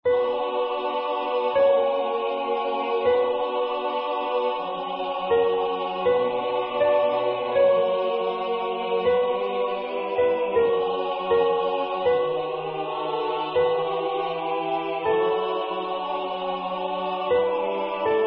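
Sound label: Soprano; Jesu Dulcis Victoria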